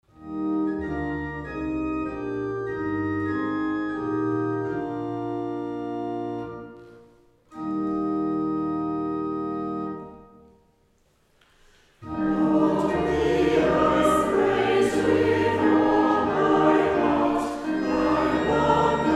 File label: Choir, hymns